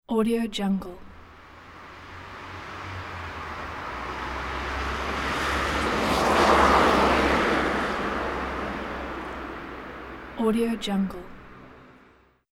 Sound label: car passage from right to left